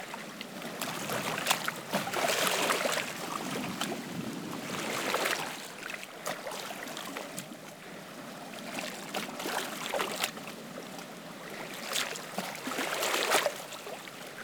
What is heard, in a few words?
Lake Water Laps Close; Midrath Lake